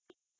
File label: wakeup; rockchip; rk3308; smart voice